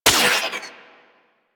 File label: Shot A; Tenno; Prime Dual Soma